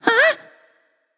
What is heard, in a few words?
surprised, voicepack; smite; jorm